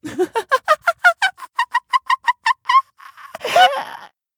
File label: Mad Laughing; Don't Be Afraid The First Toy